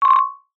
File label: cartoon blink flirt marimba